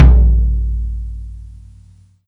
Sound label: tom